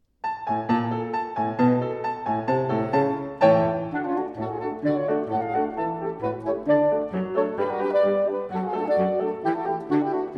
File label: Polka